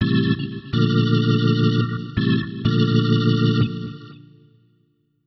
ORGAN VOCAL 125 A; Keys

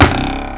AROW HIT; Kids' CD-ROM Bible Fun Pack; Education Publishing Concepts; NOAHDEMO

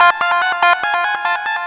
arp F 3; SpecOps2; Music

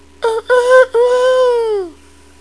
The Rooster Sound; Chronomenon 2.0.1

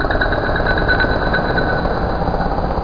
motor; Fish Fillets; engine

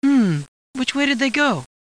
hmm; Education Publishing Concepts

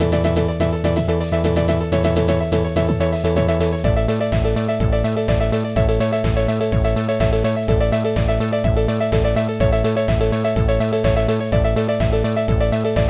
intro music; Screech

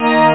church string

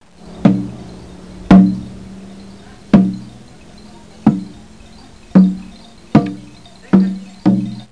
bubbl fsh; Aris Entertainment Mediaclips - Batik Designs

